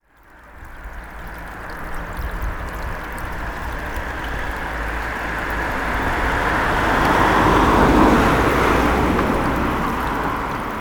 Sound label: river cars snow